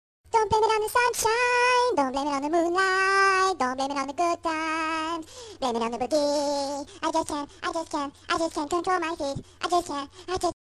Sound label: funny baby song